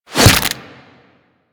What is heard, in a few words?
Desert Skate Barb Hit Default F; Warframe; Attacks; Hit Effects